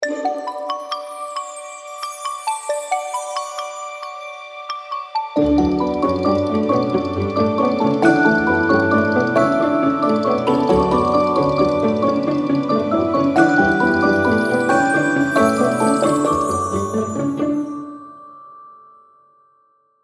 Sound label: Chanter Theme; Ms Castle